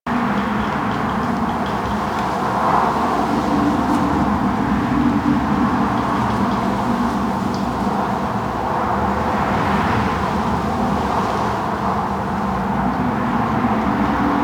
Forest Exterior Cave Open Top Amb; Warframe; Ambience; Grineer Forest

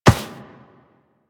Walk Metal, Footsteps; Warframe; Operator

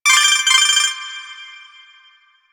UI Tool Tip; DE; Warframe; Nineteen Ninety Nine

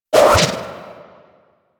Auto Crossbow Tail; Warframe; Tenno

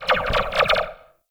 Zipline Random Slow; Gadgets; Parkour Zipline

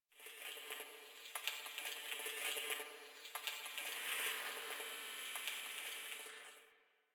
Data Texture; Warframe; Venus Open World; Venus Solaris United

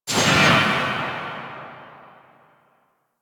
Gunblade Automatic Tail, Weapons

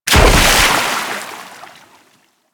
Land Heavy Water, Footsteps; Warframe; Tenno

